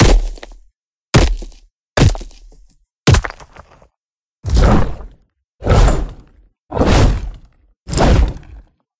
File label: Pick Axe; Aztec